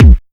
solid kick drum single shot c key, steel kick drum sample tuned to the C note